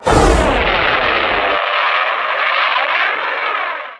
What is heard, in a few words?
missile battery; ambience